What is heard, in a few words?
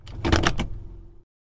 Gear Shift Into Park